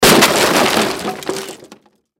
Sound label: Crash Wood Smash Break; Crashes; Sound Effects:Objects; Blastwave